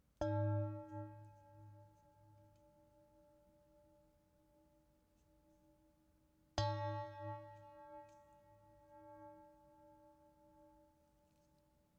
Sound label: Large Sloshy Various Dynamics